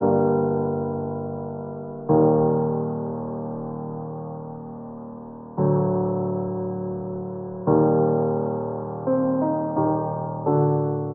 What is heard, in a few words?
piano, Loops Sad